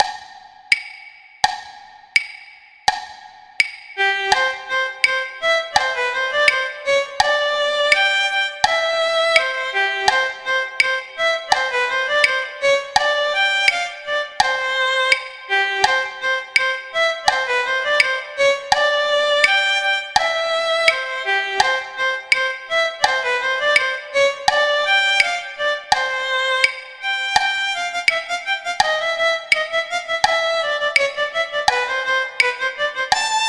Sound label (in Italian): Andantino Guitar; Paganini; Sonatas Op1 Vl Gu